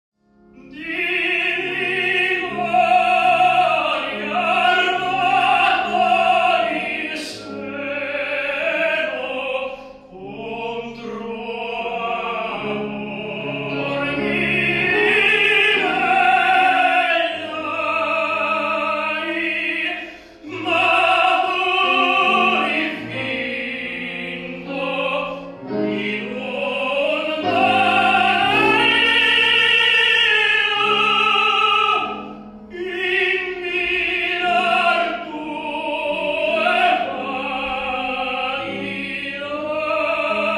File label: italian singer, tenor; di rigori armato il seno